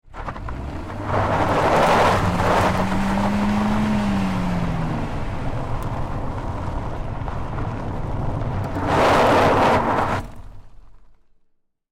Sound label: Car Tire Onboard Gravel Dirt Roll Fast Skid; Cars; BigRoomSound